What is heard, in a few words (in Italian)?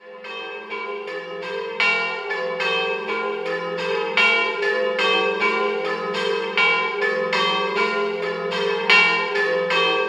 Rorai Grande, campane